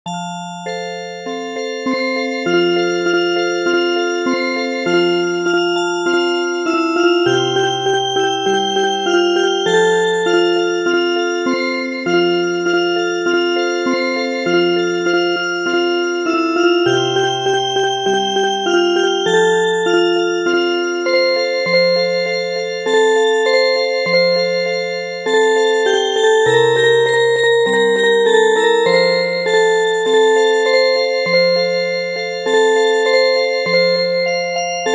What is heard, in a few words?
Music Box, midi